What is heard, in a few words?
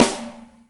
snare, drums; virtual drum sounds; custom drums